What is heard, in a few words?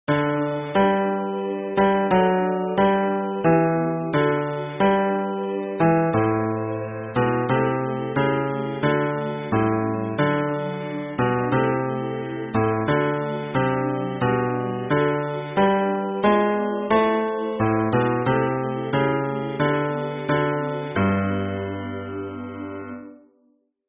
hymn bass